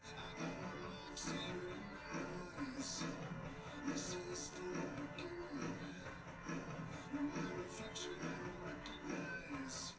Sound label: wdrums liverec; underdetermined; SiSEC11